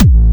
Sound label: Kick Bass Subs A